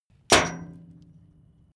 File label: metal chain impact container